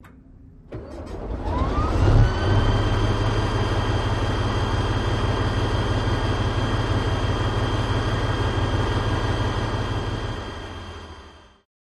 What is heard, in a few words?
M60 Tank Ext Startup S